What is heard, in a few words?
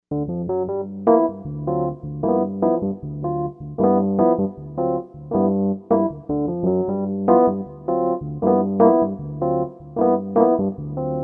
Soft E.P, Korg M1Rex; Factory Patches